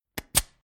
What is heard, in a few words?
Industrial Air Tool Conneting Valve Air Release Short Two Parts